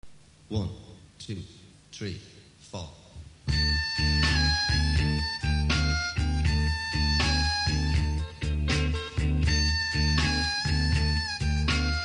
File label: sad man blues, harmonica